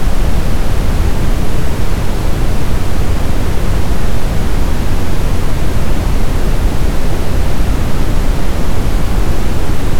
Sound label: brown noise